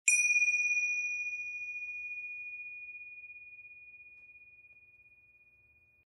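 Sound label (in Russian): najat na kolokolchik, press the bell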